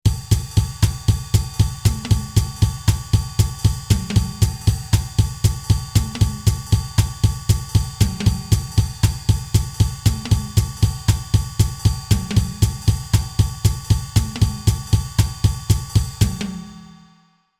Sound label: Jazz